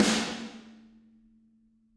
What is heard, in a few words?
Snare Hard; apulSoft apTrigga2; Steven Slate Drums